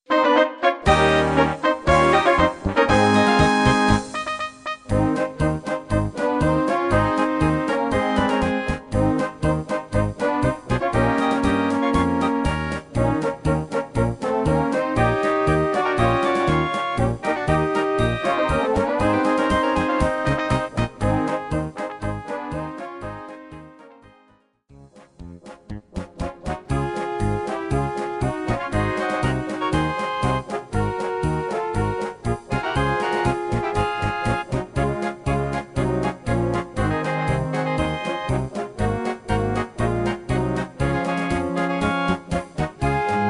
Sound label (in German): Polka